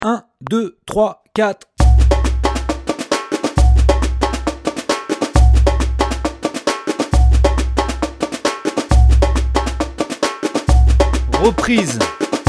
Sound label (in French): funk 150 sans tambourim